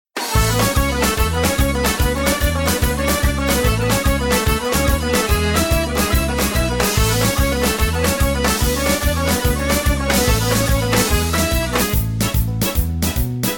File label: (A) No Backing Vocals